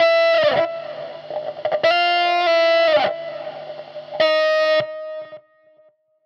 Guitar Line, 100bpm, hiphop; musicradar

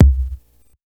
Tech Drum Hit, techno 120bpm

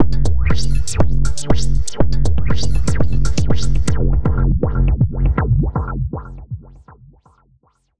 Tech Beat 120 fx, techno 120bpm; musicradar; Beats

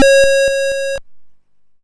YM Arp C#; chip hits; arps; CHIPSHOP DELUXE

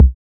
Kick, techno drum

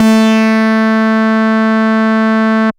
DnB Bass dist A3, drumnbass 170bpm; musicradar; Bass A Multis

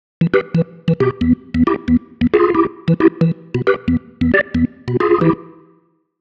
Keys line 90 E, 90bpm, hiphop; musicradar

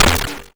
Snare, YM, CHIPSHOP HITS DRUMS; ChipShop Deluxe

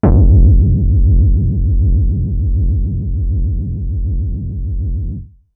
Moog Storm, techno drum fx; Single Hits

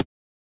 Snare, techno drum

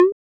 Perc, techno drum

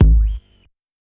Tech Drum Hit, techno 120bpm

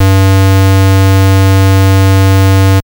SID Bass G; CHIPSHOP HITS

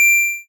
Ym Multi Organ D4; multi-samples; CHIPSHOP DELUXE

